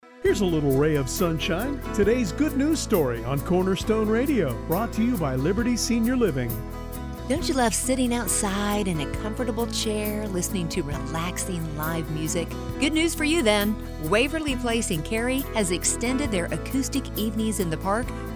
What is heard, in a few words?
Acoustic concerts; Waverly